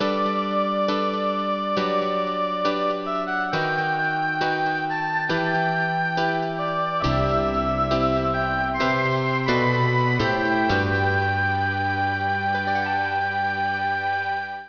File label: Defeat; Pebble Beach Golf Links; Saturn